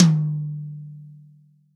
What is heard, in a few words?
TOM, Real Drum Kit, POP KIT